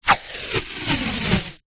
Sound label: band aid plaster strip peel from roll